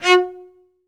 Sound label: SOLO CE, Strings; Synclavier; ILIO